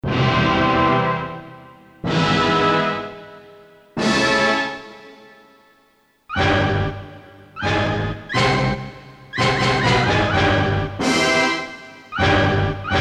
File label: Orch, Orchestra Hits; Korg DSS-1; KSDU-011 Group & Orchestra Hits